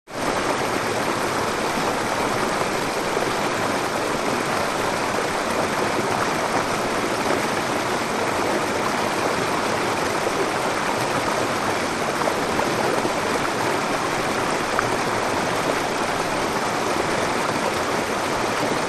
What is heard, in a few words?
Waterfall Medium